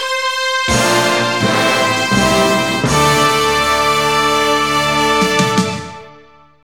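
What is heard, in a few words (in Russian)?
фанфары торжественно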